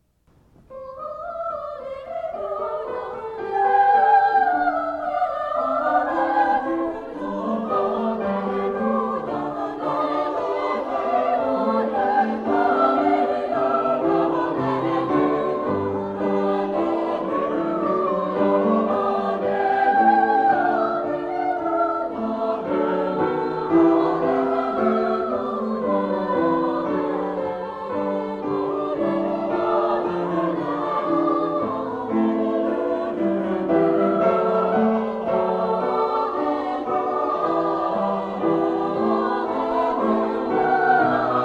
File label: Alleluia, chorus; J.S. Bach